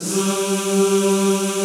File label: G2, Extended Classical Choir; Best Service